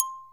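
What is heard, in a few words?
CHINESE XYLOPHONE; AKAI S6000 CD-ROM - Volume 1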